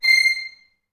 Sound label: C6, Advanced Orchestra; Best Service